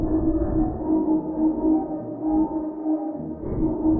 Sample n Hold Verb Loops, sparse soundscape; SnH Verbs

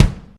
Acoustic Kick; Digital Sound Factory Xtreme Lead

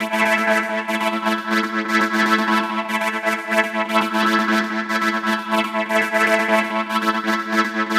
Progressive Synth, Trance

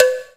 TICTALK DRUM

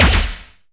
Strange Kicks, Percussion; Dance Synths

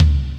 toms, disco; Best Service ProSamples vol.48 - Disco Fever; AKAI

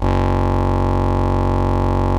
LAMBDA, String Machines

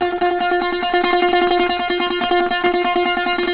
SAZ; Roland - LA Composer vol. 3

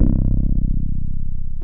BASS BANK, L; Sound Gallery - Charged

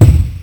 Beatbox Kick (7999Hz); Sonic 3; M8; Genesis

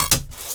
noise, FX & ATMO, ALARMS & ALERTS; Techno Trance; Techno Trance Essentials

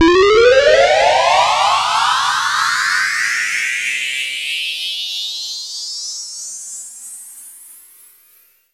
FX sweep, synth sweeps

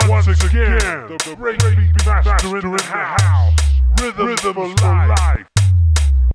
drums, Hardcore Breakbeat, 151; Big Fish Audio; Hardcore Breakbeat Action; Rhythms 4 Life 151 Ebmin

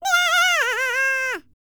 BLACK SEA, World Voices; ILIO; Vocal Planet VOL-5

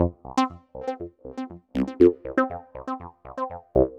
UR 303, 303 loops; Sonic Foundry (Sony Creative Software); Ugly Remnants VOL-2

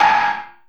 TOCK, Anime Sound FX; COMIC

